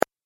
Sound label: Click Sound